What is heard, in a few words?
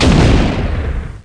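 Rifle Shot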